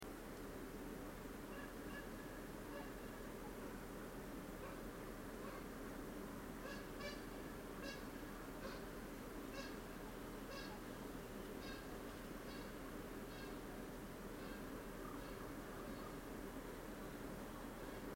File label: trumpeter swan